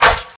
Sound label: swipe; Fruit Slicer